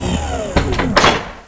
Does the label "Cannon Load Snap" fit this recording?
Yes